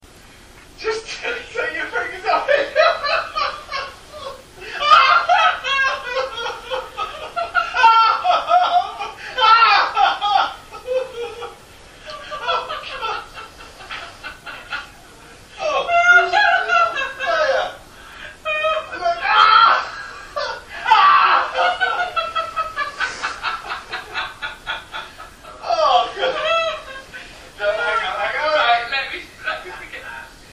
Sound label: laughter therapy